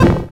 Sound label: FX A, ANALOG; Sound & Vision - Gigapack II